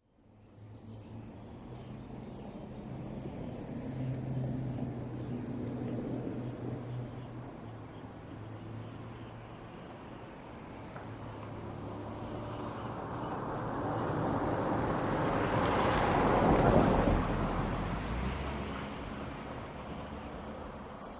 Ambience: Suburban Street with Birds And Light Car Bys.